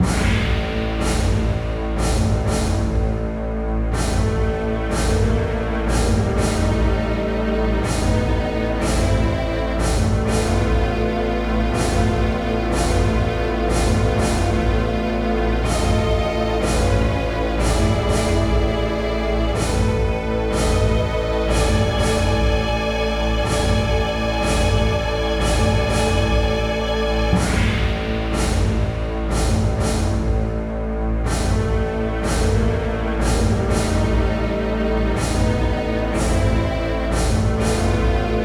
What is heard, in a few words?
Timeup; Dungeon Explorer: Warriors of Ancient Arts